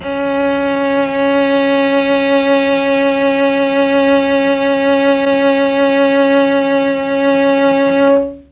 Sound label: C#3 300 CELLO, Mellotron, STRINGS; Mike Pinder - Mellotron Archive CD